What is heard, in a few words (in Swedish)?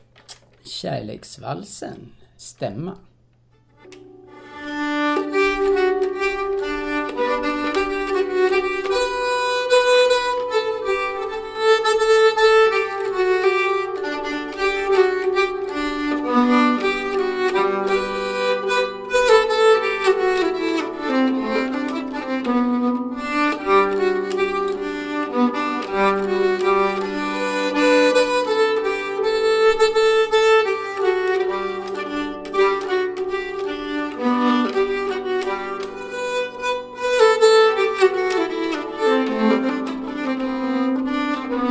Kärleksvalsen stämma